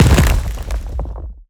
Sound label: creature wood footstep heavy; video game; Bluezone Corporation